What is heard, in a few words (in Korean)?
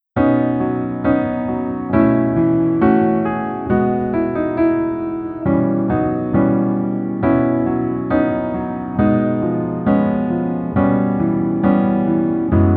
Piano ver 멜로디MR -1키